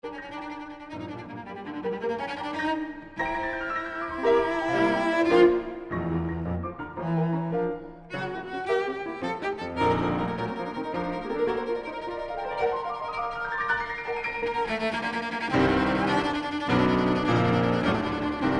Cello Sonata in B-Flat Minor, II. Scherzo Vivace Assai